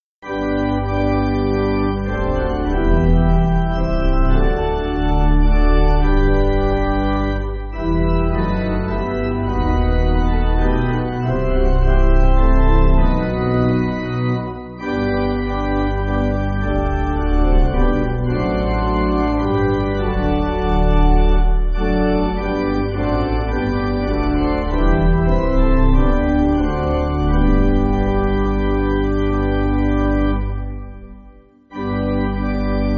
Pipe, Pipe Organ

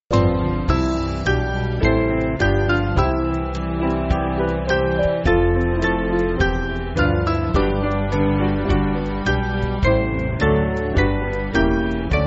Band, Backing Files for Churches; On Wings Of Living Light; Old 148

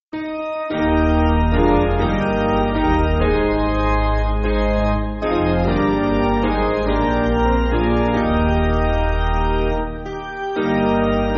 Piano, Backing Files for Churches

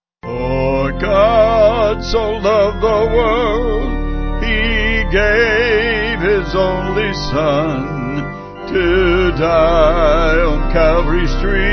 vocal organ

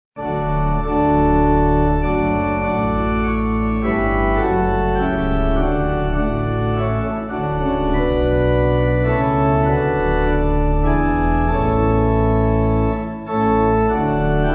O For A Heart Pipe

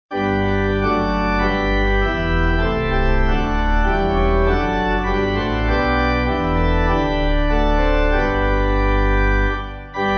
Pipe; Come Holy Ghost; St Stephen

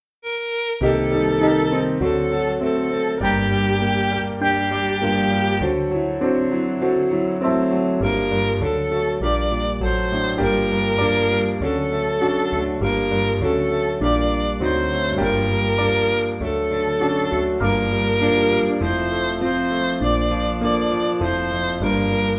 Midi

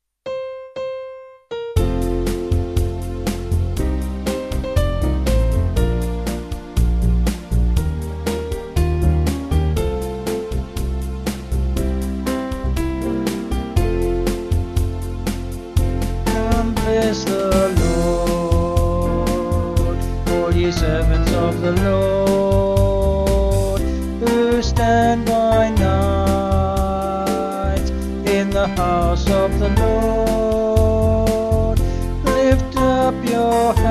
Vocals Band

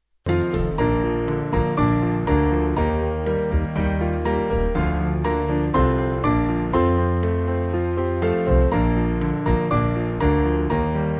Band, Music Backing Files for Churches

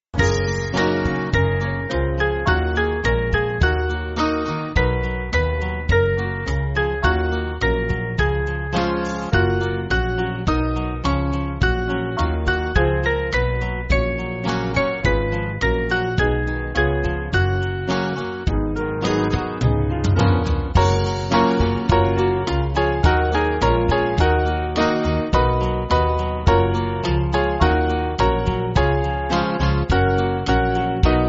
Band, church music

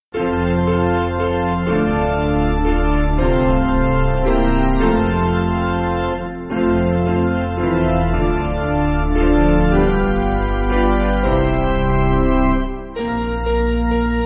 Hymnary Piano; Basic Piano & Organ (CM)

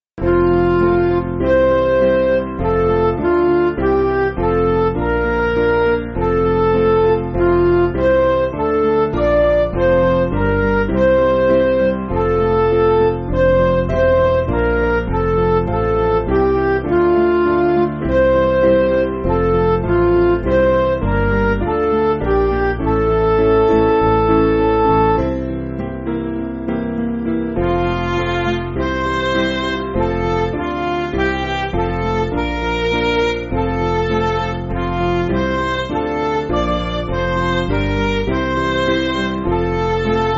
Midi

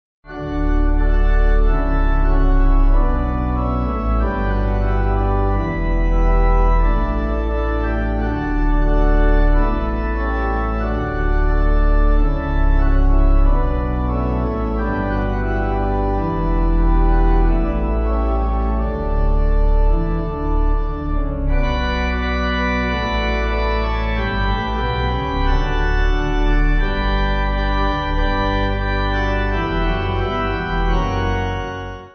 Organ

